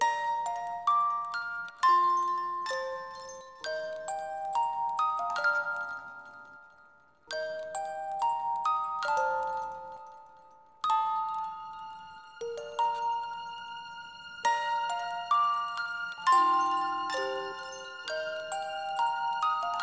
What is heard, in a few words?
bgm music box